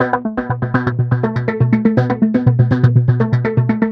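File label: 122 BPM Sequence C major A minor Tonic G; innovative sequences 1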